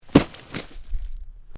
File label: Body Movement Snow Drop; Body movement foley to simulate falls and deaths; Drops (Sound Effects:Objects)